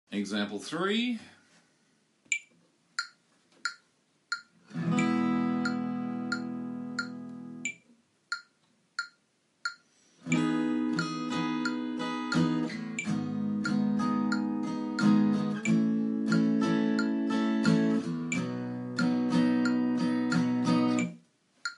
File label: Chords